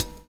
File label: N.Y FILL; Univers Sons